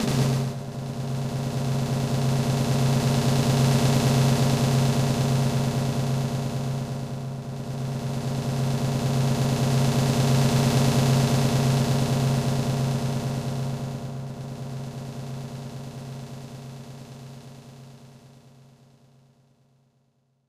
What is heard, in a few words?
Drumroll; Dynamitracer; snes